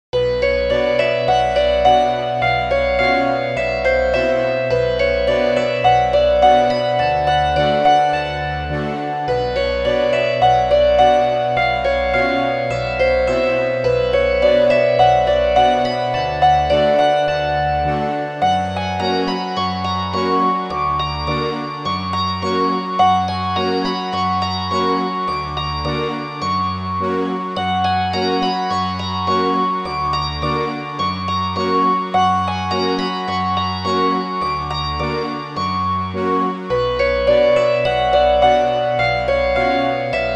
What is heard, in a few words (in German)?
hackbrett